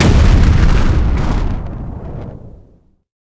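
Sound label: explosion; StarSiege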